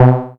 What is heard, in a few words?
Filtered B, Basses; Zero-G; Total Drum Bass